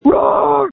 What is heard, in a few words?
screams